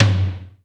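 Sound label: GATE tom; Crypton; DrumBase5000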